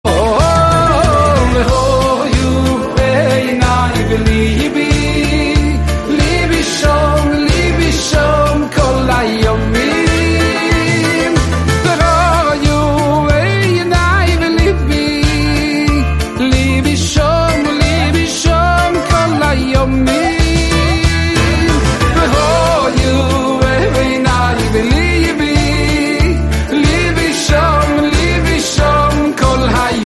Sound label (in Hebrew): צילצול